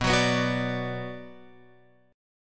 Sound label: B Minor B Guitar Open F; strummed; JGuitar